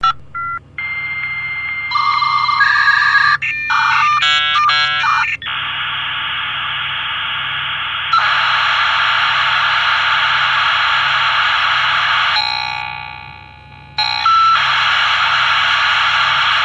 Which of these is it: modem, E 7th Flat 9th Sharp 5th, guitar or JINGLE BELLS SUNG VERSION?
modem